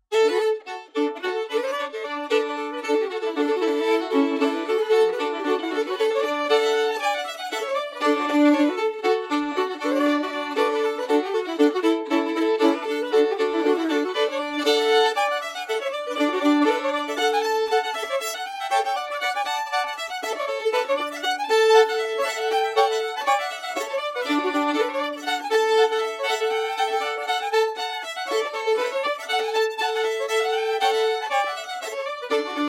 Fiddle Tunes; Soldiers Joy; Fiddle Tunes of the Lewis Clark Era; New Columbia Fiddlers

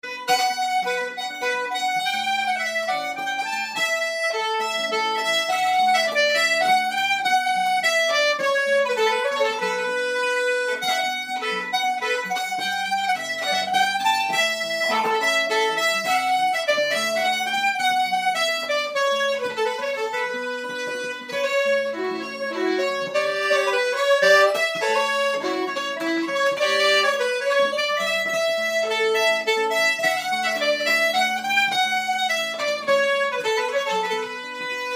polska in Bm

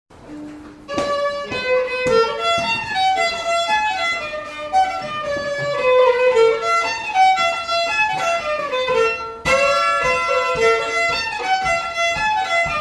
Jig

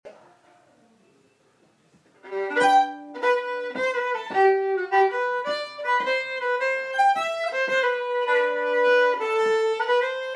Polska In G

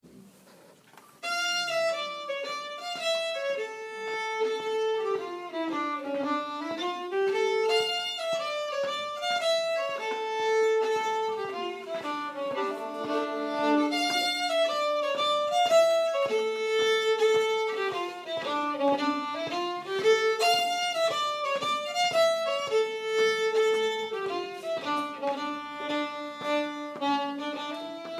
Polska; Polska Efter Skinnnar

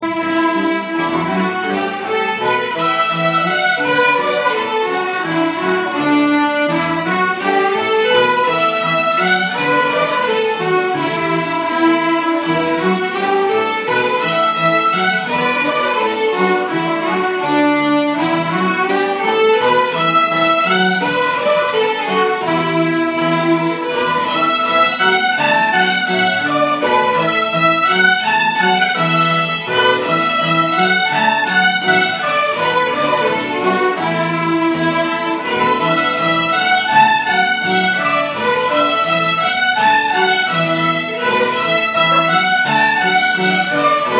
Polka

